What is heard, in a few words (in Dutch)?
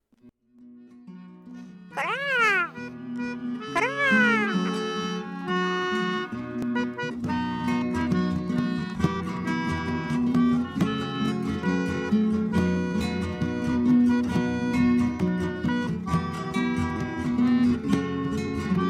kraaien karaoke; musical de goede bakker